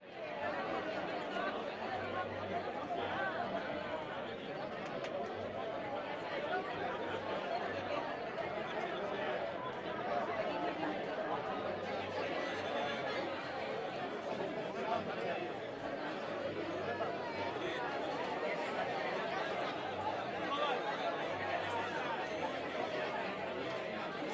ambience wedding crowd crowd in a open bar wedding banquet surround; sonniss gdc